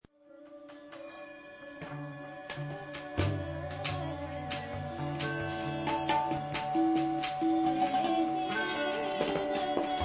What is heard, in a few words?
Singing bowl impro; EthnoSuperLounge